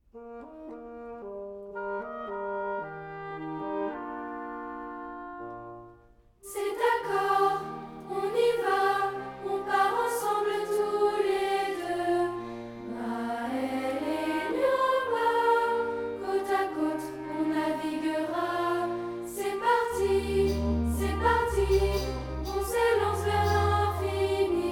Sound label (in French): voix orchestre